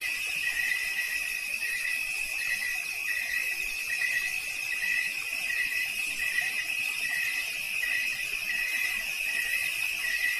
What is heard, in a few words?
night